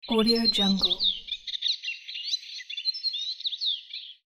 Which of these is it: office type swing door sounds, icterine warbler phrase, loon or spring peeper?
icterine warbler phrase